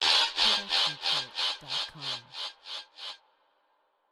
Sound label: horror scary laughter; soundscrate